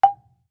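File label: Marimba deadstroke fortissimo G5; MIS Pitches - 2014; Percussion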